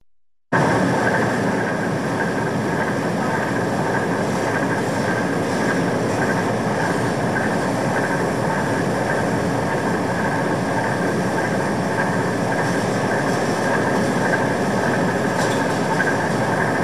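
factory noise and sounds of production general atmosphere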